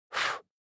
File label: Blow Sound Human Blow Candle